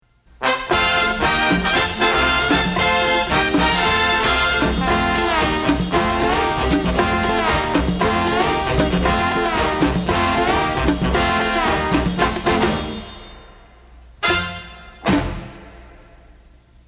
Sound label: Inst; Tops In Radio; Without vocals; CKWX; 1961; Anstey Collection; Jingles